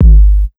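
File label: Big Beat Drumloops, bass drum, right; USB Soundscan vol.25; AKAI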